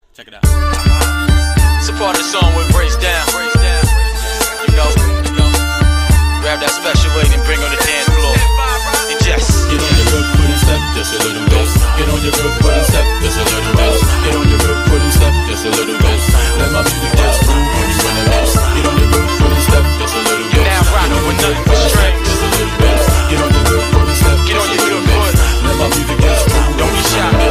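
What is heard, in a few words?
Elegant Violin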